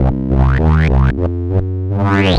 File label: Roland C Line, Roland S100